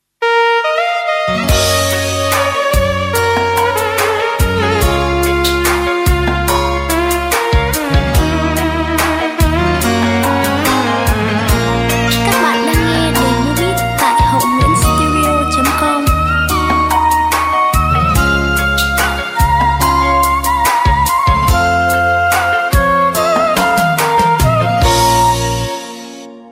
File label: beat, playback